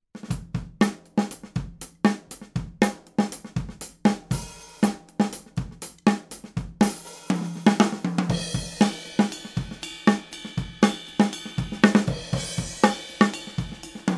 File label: OH Drum Kit; sE Electronics; sE7 vs. sE8